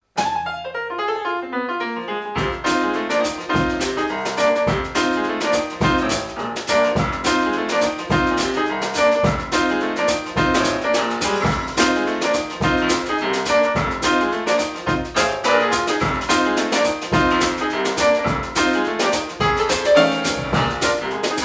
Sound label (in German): Memphis Stomp, pianistin